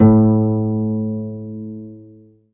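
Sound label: HARP B4 SUS, Strings; ILIO; Synclavier Strings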